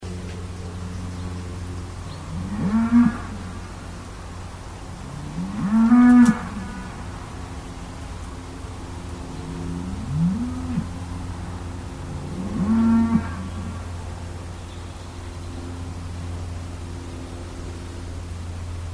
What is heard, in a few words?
cows; fieldrecs